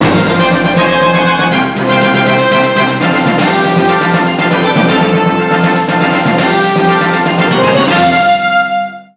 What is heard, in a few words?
alliance jingle